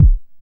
BD ANALOG; Sound & Vision - Gigapack II CD 2

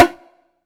Mid Bongo Hard Slap; Groove Elements

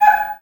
BIRDCALL, Heart of Africa; Spectrasonics; BIRDMEN

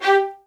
MED ATT G4, soft violins; Q Up Arts; Denny Jaeger Master Violin Library

